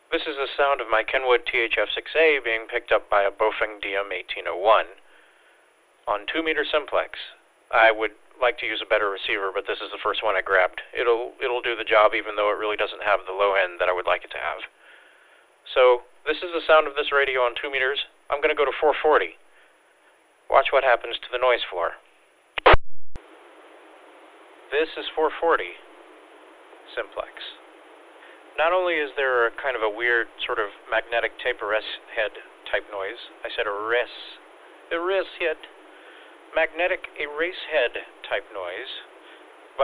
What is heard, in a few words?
Weird TH F6A Audio Problem